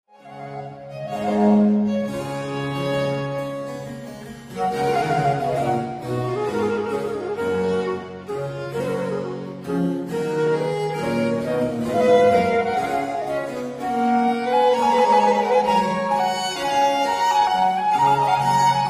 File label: for 2 violins & continuo Second; Les Nations; Seconde Courante